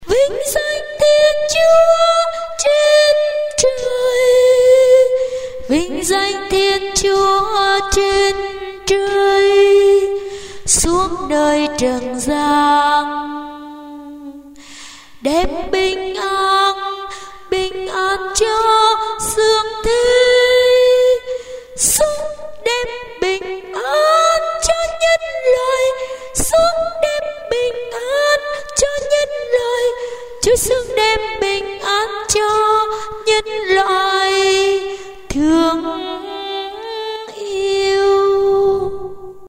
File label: tenor